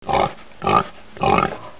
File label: houndeye idle; svencoop